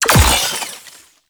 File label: Belt Fed Rifle Active Reload Fail; Warframe; Tenno; Frumentarius